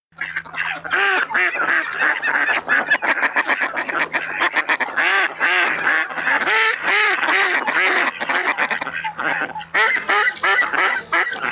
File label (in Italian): GERMANO